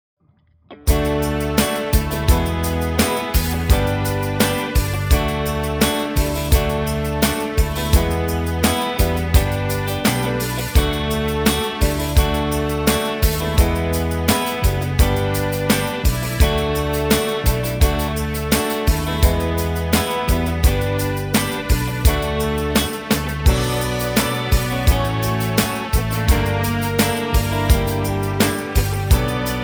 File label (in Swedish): Sitarmelodi